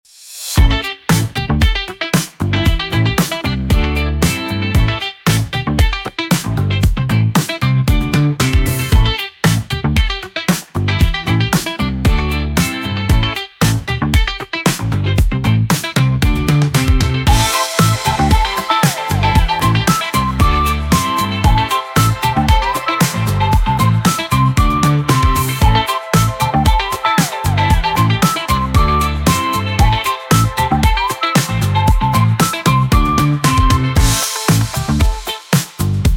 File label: funk